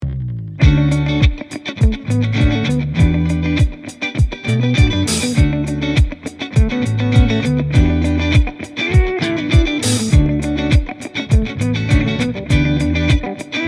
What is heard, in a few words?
70's Sitcom with Guitar and Horns